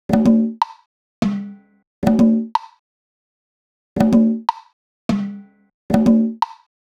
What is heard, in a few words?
perc 124; Drum Sample Pack; Loops; SD SEISMIC; Slate